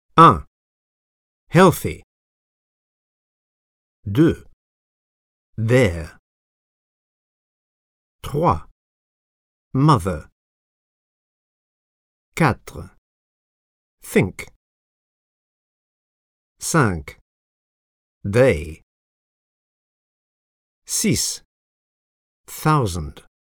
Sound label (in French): son techno